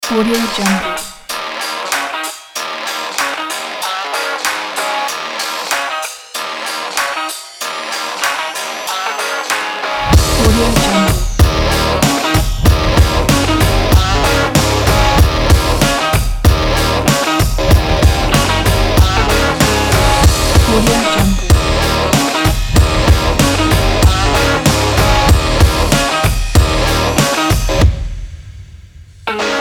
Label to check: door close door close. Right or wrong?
Wrong